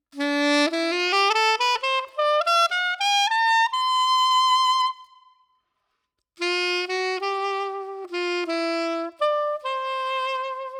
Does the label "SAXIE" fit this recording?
Yes